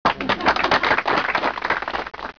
applause light

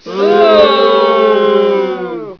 boo, audience

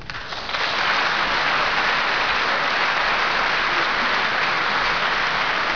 applause large room

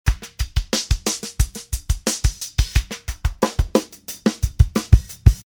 drums phase

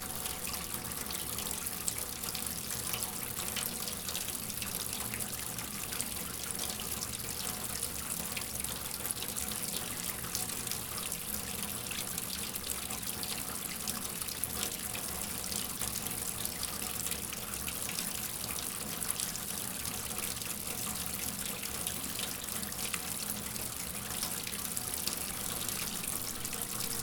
Tap Basin, water

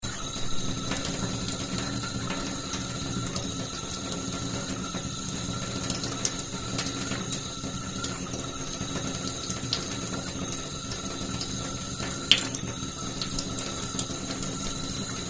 Stainless Steel Tub Running Moderate, water

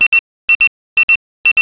pager; Pager 3

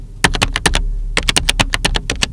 typing slow, computer

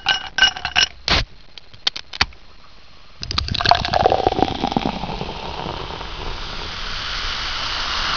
ice pour

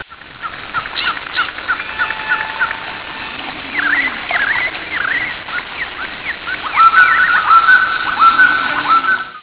stream, nature

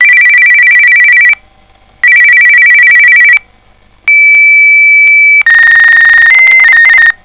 fax, office

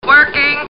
computer working; Star Trek TOS

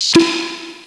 bottle open

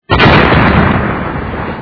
bomb

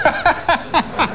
laugh man

